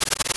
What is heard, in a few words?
Warp Loop; Warp tornado spinning (loopable)